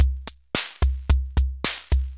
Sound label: UNIVOX, Univox MicroRhytmer12